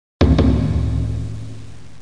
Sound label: OPEN; KARUTA; doujyou